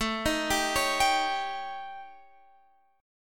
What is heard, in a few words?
D sharp 7th Flat 5th A